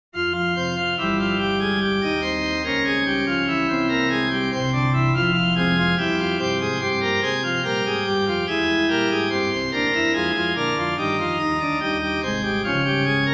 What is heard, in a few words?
2-part Invention organ